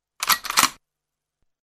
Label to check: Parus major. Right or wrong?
Wrong